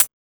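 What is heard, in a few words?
HIHAT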